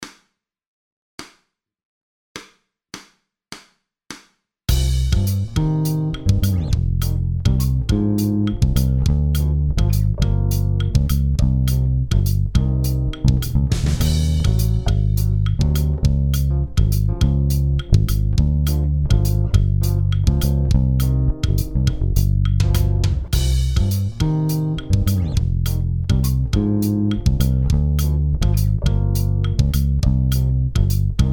salsa

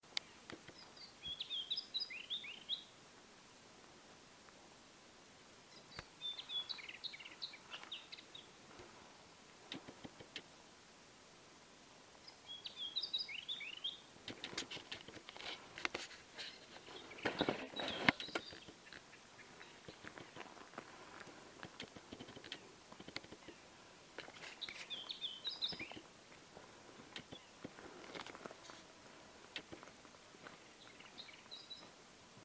lesser whitethroat, Gobi desert

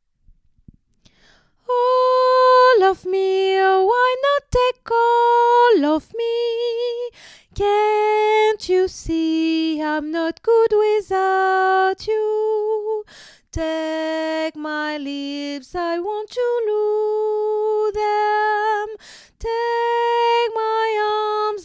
tenor

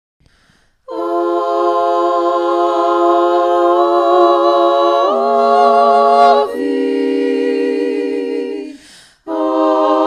ave maria tutti

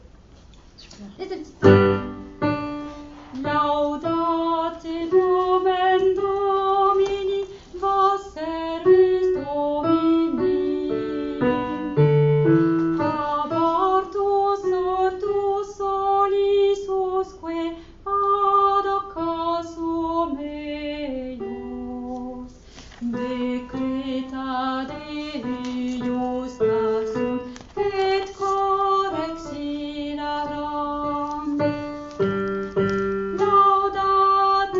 laudate alti

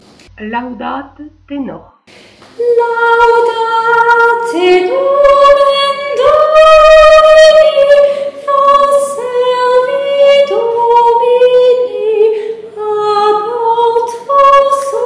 laudate tenor

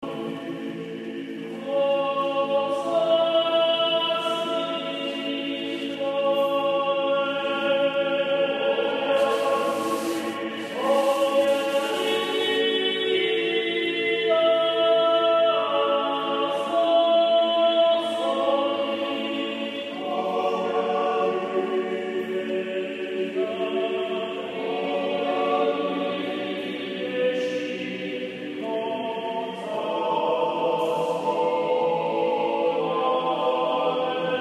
gregorian

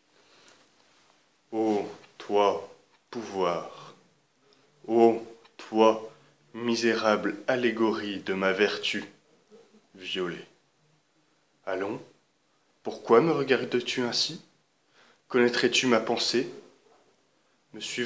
vocal

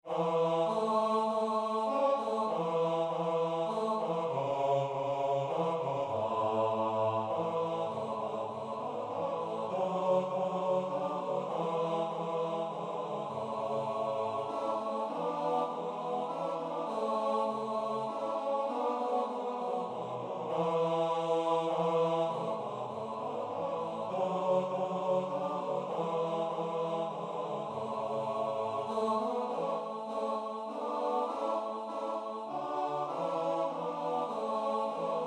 voices

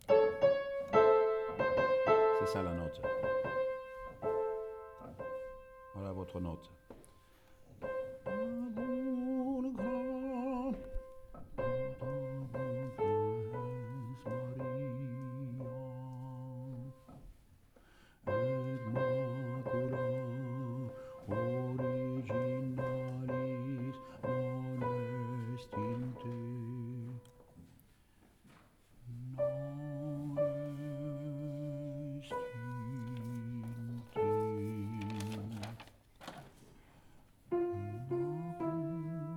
tota pulchra sop

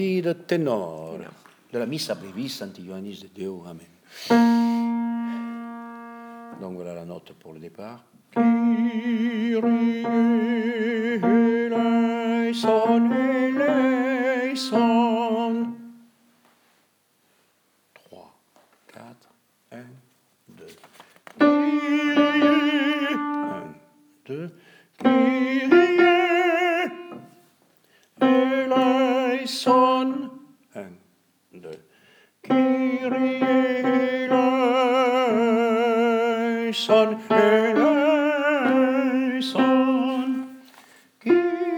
tenor

